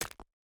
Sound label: environment pickaxe hit stone